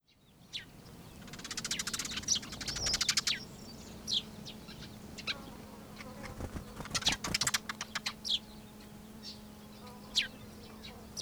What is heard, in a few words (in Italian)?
Passera mattugia Passer montanus; Giovanni Tomas; Xeno-canto